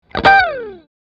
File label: Electric guitar slide